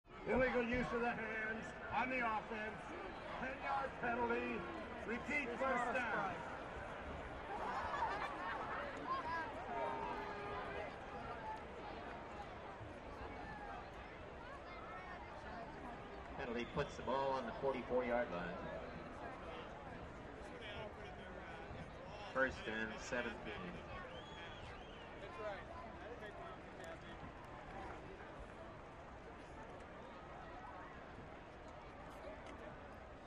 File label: Stadium Football Cro, stadiums